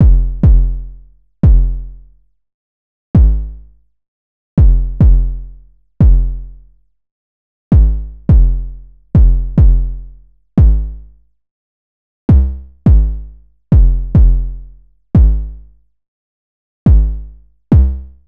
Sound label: 808 loop 105 Fm; Slate Digital BASSHOLE Sample Pack